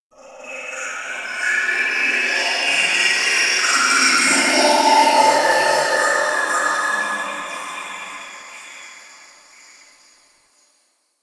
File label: Ice Distant Robotics; Ambience; Warframe; Corpus Ice Planet